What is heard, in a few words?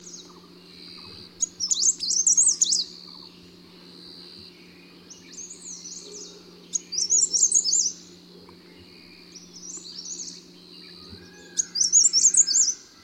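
Phylloscopus xanthoschistos Grey Hooded Warbler, Himachal; Chail Kandaghat Road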